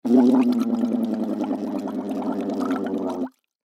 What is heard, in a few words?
Mouthpiece Blow, Mouthpiece Blow Water Deep Bubbles; Cartoon; Musical; Blastwave